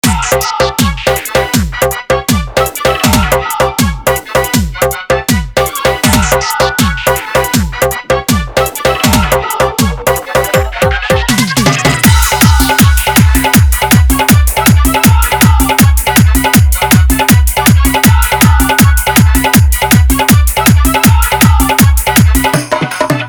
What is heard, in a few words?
Dhollki Bass Dance Mix